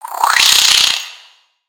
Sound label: Spider Rage; creatures mechanical; Lego